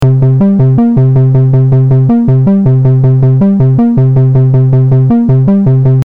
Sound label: ms20 smashed